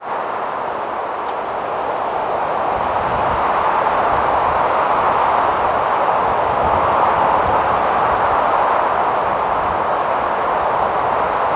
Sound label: nature very strong wind in tree mojave desert california usa; felix blume